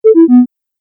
Sine Wave Confirmation, beep

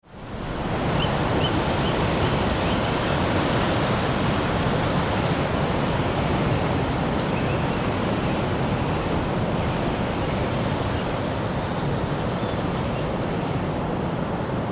Wind in Trees Heavy with Birdsong; Weather

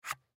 Packaging Tape; Tape Dispenser Remove Tape From Wheel; Tape (Sound Effects:Offices); Blastwave

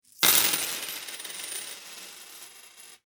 Foley Coins Drop On Counter; Money; Objects

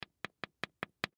Key Tap Wood, Key Tap Light; Tapping; Foley; Objects; Blastwave

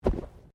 Bodymovement Fall Light; Body movement foley to simulate falls and deaths; Wrestling And Struggling

